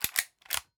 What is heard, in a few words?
lever-action rifle lever gun